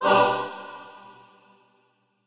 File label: chor